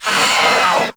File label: Kavat Vocal Death; Warframe; Khora